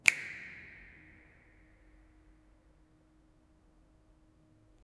single sharp finger snap; isolated

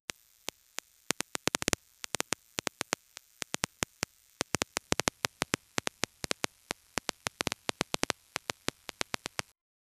intermittent spark sounds

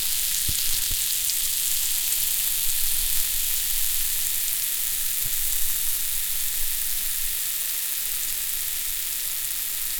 deep frying, bubbles

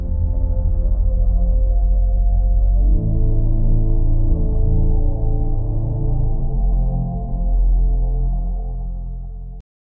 footsteps on gravel slow; cello

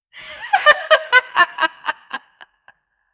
a womans laughter pleased; ancient

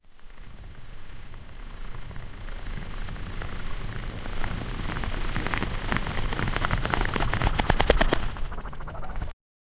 slow running monkey

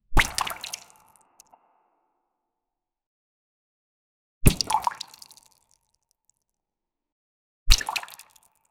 a realistic thick liquid, slime

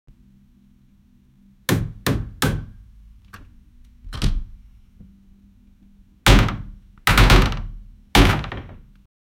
the sound of a door, lock

